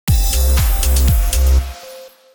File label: start; Amatic; Fruit Box